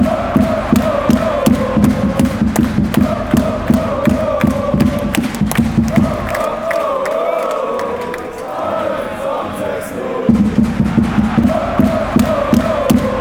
oh oh oh woah, chants

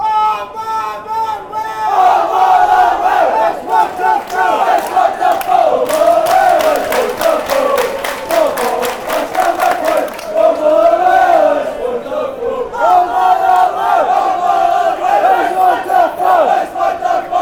is wonderful, chants; motherwell

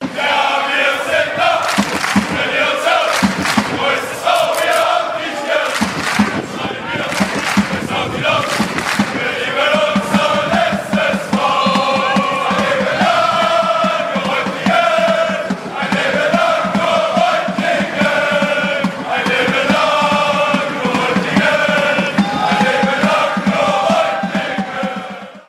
ja wir sind da fan chants

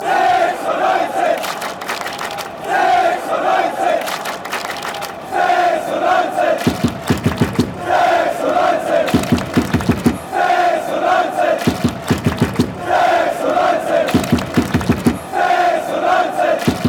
ninetysix, chants

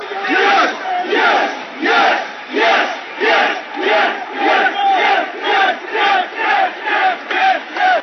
yes chant; Sung after a Union goal

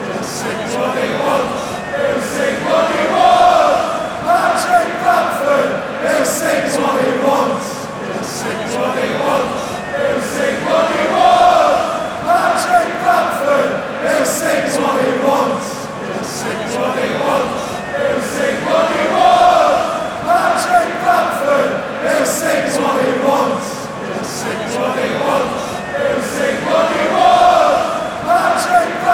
fanchants

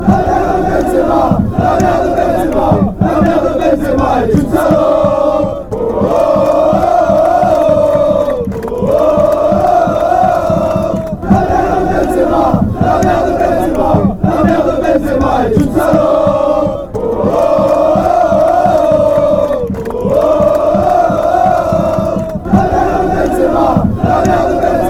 fan chants; benzema

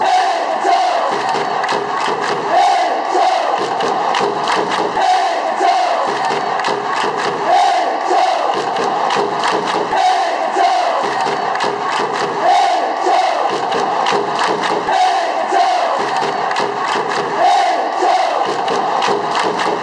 incheon call

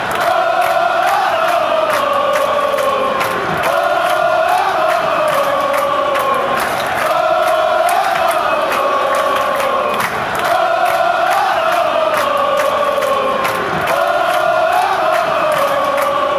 woah oh oh woah oh, chants

